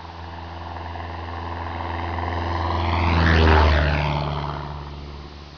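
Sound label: takeoff; 12000 Graphics Pack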